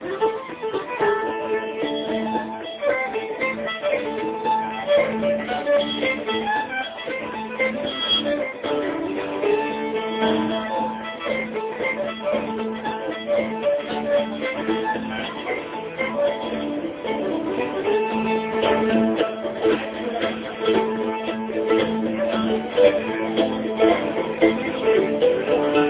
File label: Mando Tune